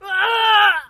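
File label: Death Screams Male, battle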